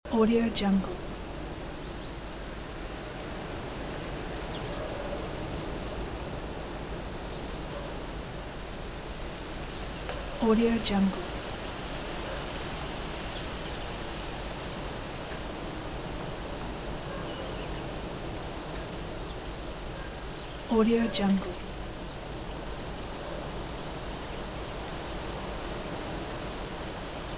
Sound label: windy november village ambience; وزش باد